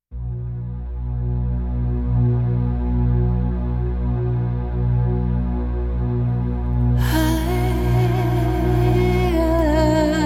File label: 432 Hz Sound Therapy 432 Hz Activating Goals